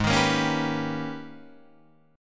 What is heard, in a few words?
G sharp Minor Major 11th, Guitar Drop D